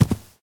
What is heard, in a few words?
grass, step; Minecraft; Votive World